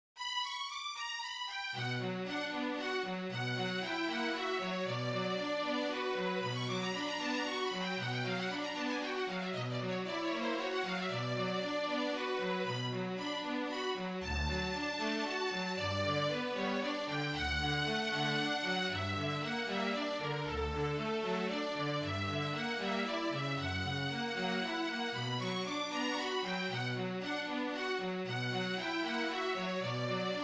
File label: Trio Chopin Nocturn, Flute Trio Version; Nocturn Op.9 No.1 - Chopin